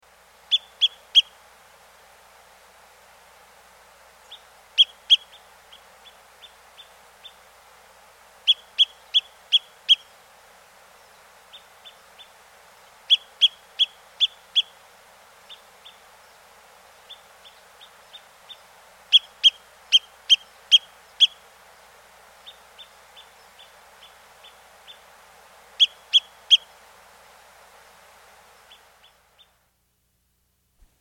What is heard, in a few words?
Dark Pewee, Nature's Songs, S. America